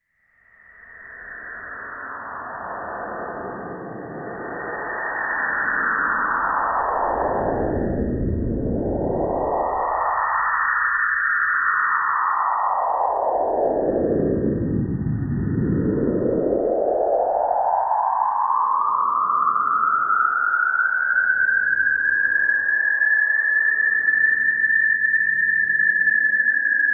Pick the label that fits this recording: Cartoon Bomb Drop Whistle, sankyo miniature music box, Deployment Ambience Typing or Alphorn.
Cartoon Bomb Drop Whistle